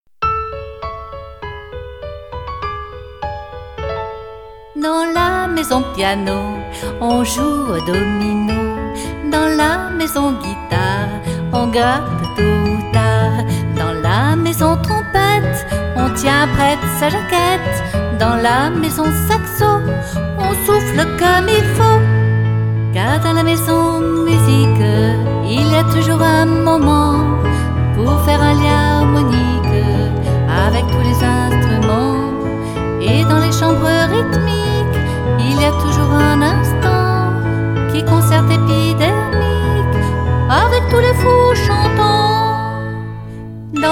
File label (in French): piano; La maison